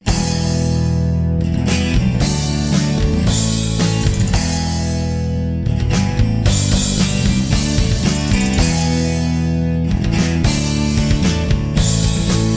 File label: karaoke; music smorgasbord